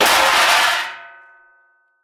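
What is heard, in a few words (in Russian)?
упавшая металлическая крышка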